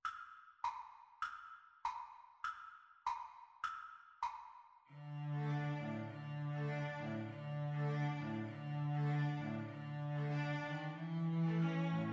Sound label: string trio